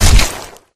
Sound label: elite bodyfall; flood